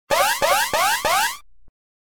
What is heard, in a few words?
Boss Siren; Star Fox Command